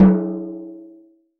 TOM, CTD TOM MLT

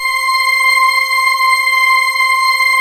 JV CHURCH, JV-1080; Syntec; Wall of Sounds

